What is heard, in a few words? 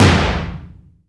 TOM, Drums; Best Service; Real Mega Drums VOL-1